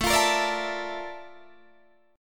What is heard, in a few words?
F Major 13th A, guitar chord